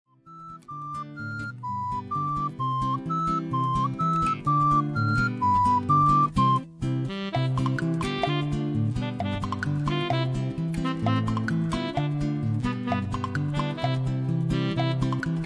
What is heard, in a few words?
instrumental